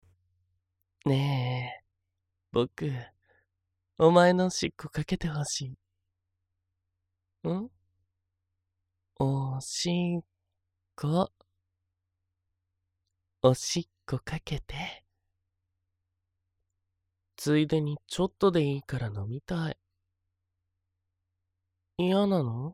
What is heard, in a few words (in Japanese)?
おしっこ